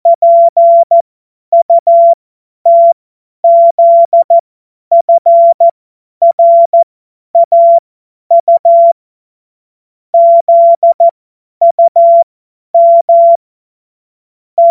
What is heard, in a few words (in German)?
Witz, Morsekurs, cw morsen; Tempo 50 F70; hamradio; cw witze